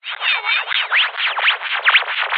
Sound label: science fiction radio static interference pulsating noise